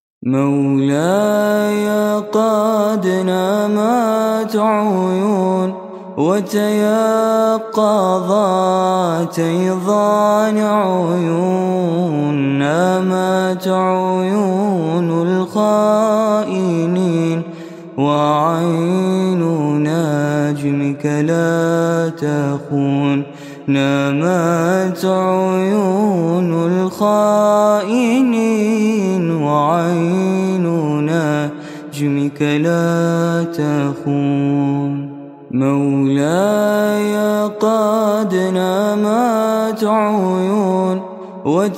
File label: Vocals Only, nasheed